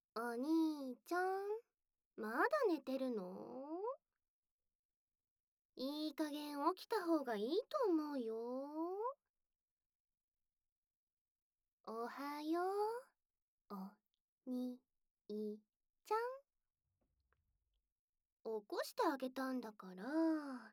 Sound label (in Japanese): シンプル 耳舐め 目覚まし; メスガキ妹